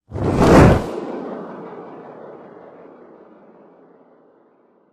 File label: Fire Sweep